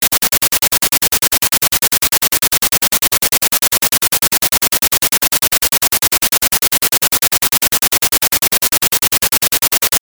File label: noise meandr